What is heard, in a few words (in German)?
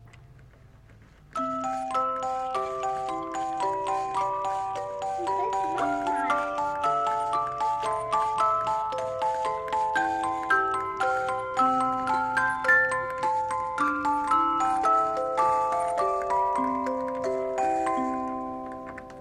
mausspieluhr; moesche